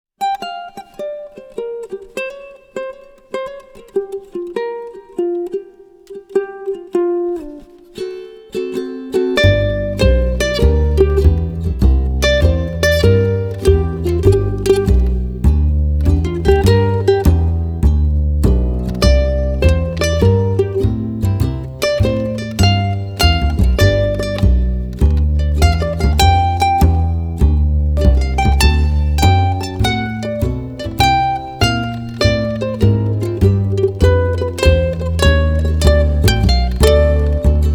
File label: Blues fill; Comic 드라마